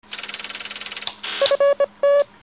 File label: security pass check